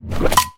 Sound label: helmet off; talking tom gold run gamerip 2016